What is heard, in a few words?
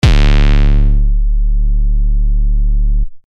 D#, 808; SERUM 808 VOL4; Catizlla